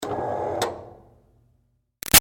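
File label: Projector Screen Close Up Up Short; Projectors (Sound Effects:Offices); Big Room Sound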